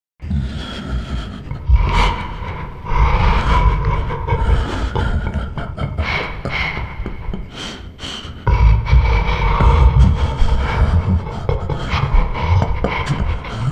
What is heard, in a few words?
VOICE Monstrous Giggles; Eternal Darkness Requiem